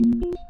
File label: logout; fchat-rising